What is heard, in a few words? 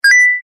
game coin sound effect slower